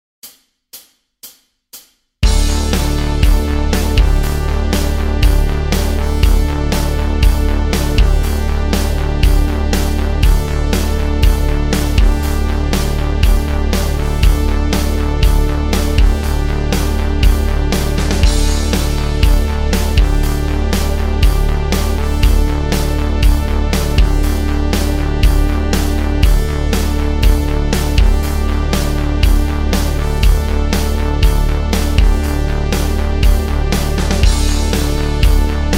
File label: backin